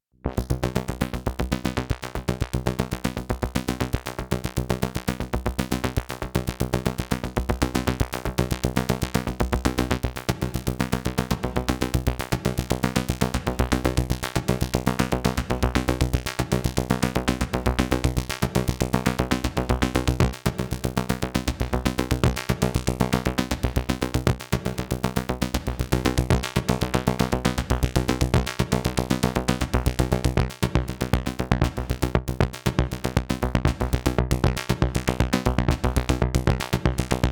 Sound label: Karplus Strong